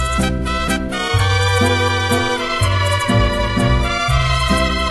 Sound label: Funny Samsung Message Tone